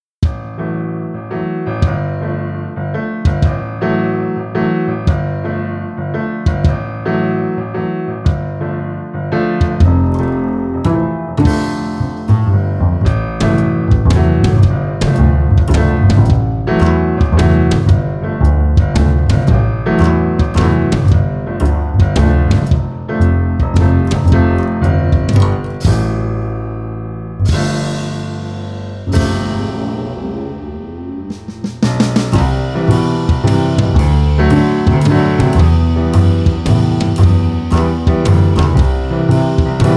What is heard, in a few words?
jazzy dirt